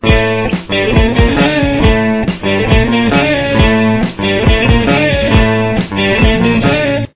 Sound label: guitar